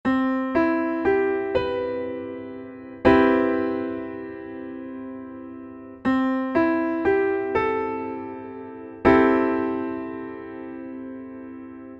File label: Cmaj7 C6; 6th chord